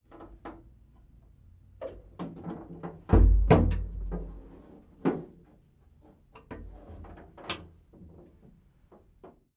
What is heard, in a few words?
M60 Tank Int Hatch